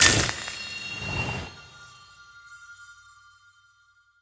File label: ice activate; smite; god merlin